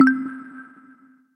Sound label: Nudge; amazon fire hd 8